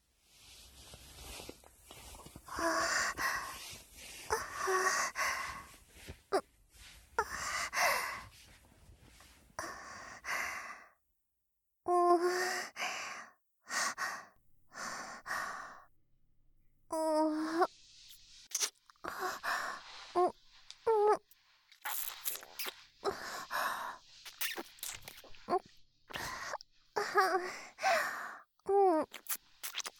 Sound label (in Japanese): 大好き ホールド キス